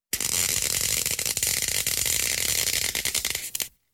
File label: Cracking electricity